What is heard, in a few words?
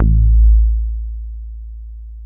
DEEEP A1, BASSES; Best Service Alpha Dance vol.2